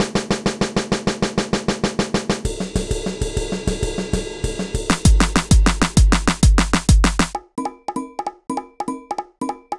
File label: 98bpm; GroovePizza